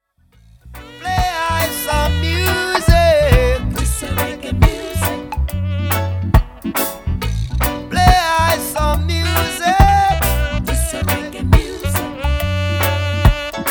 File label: Reggae